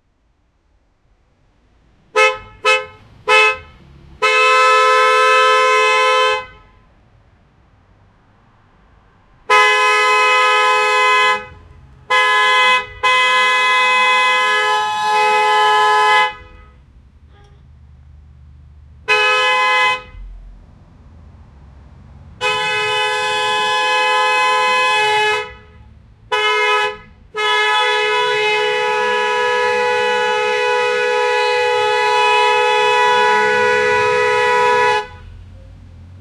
car horn no back sound

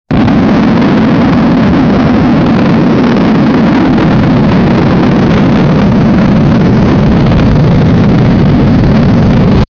a rocket leaving the base; ascend

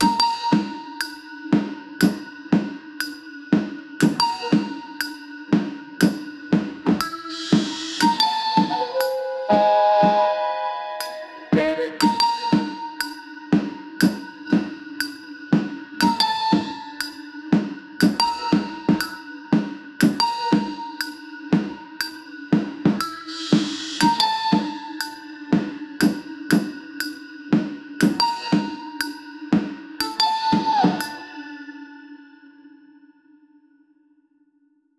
japanese hip hop sound, tokyo

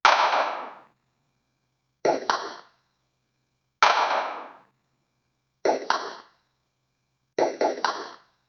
cute platform game jumpin; platformer

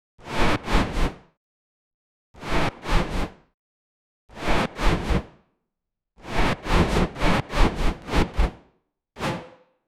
rhythmic sounds; match

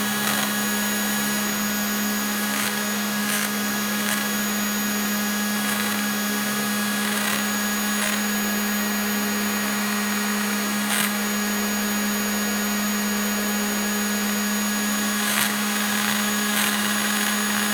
the plastic case of the, machine

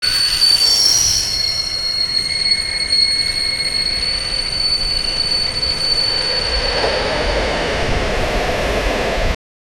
an s bahn screech

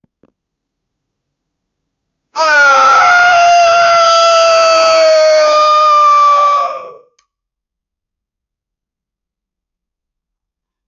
a human scream of pain

